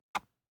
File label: DICE on Cardboard, Grab, Standard, One Die; Samples; ArticulatedSounds; DICE Sfx Party (Extended)